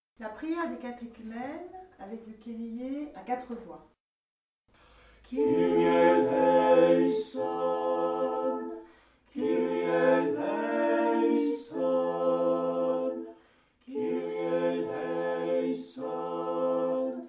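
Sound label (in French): Kyrie 4 voix des catéchumènes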